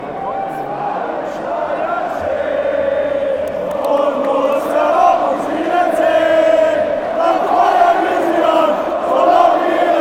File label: allez borussia dortmund allez allez, chants